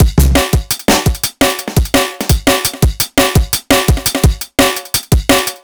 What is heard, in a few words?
Jungle Can Beat, Electronic Beats; KJ Sawka; Drum n Bass & Beyond